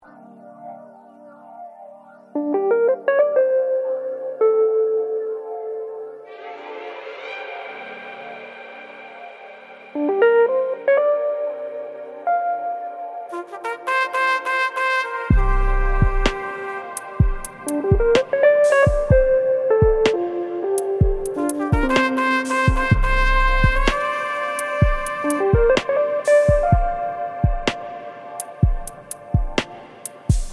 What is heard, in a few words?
jazzy beats